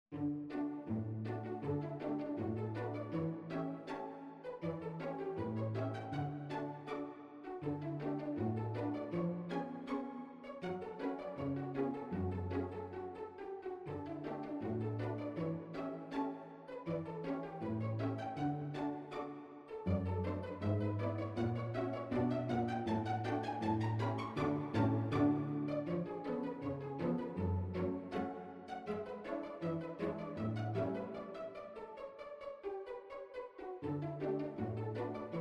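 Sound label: Pizzicato, String Orchestra; Pizzicato from Sylvia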